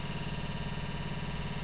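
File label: compressor loop, Train cl314; OpenBVE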